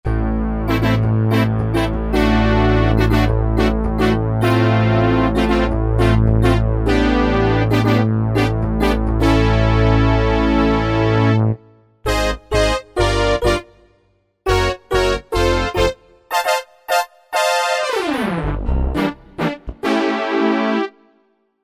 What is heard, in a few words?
Touch Brass, Korg Wavestation A-D